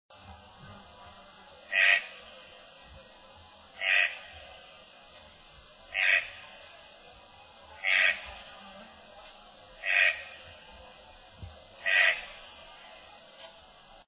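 tucan pico ver, Tucán Pico Verde, Ramphastos dicolorus, Red-breasted Toucan; cantos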